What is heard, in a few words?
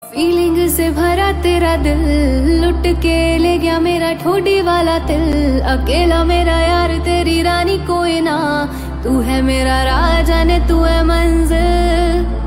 female, ringtone